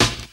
studio snare drum sample f sharp key

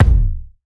reverb bass drum sound b key

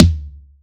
short reverb urban tom single hit d key; tom drum

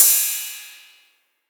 crash cymbal drum sound f key; Cymbal drum one shot samples

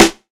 crisp snare E key

